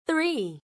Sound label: Key Tone